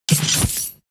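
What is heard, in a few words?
KATANA SWORD normal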